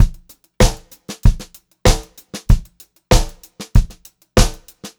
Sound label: VARI, Pop-Rock Drumloops; USB Soundscan vol.37; AKAI